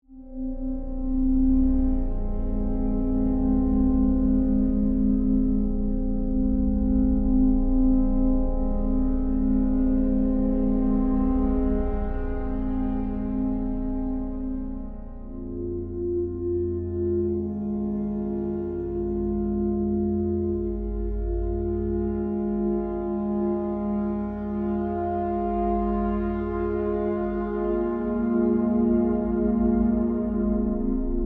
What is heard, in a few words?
Bed Crystal Swell, Production, Element, Mysterious, Eerie; SoundEffects-BlastWave